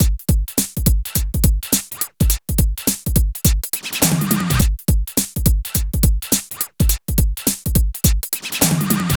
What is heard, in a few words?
Drum Loops